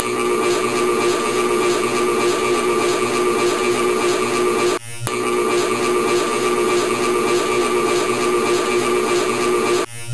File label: Elec Equipment